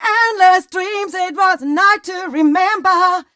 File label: f mel e flat, fem Vocals; Mutekki Media; Diamond Vocals 2